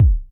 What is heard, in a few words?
Quik Buzz, Electric Kick, Yamaha DTXpress; drum machine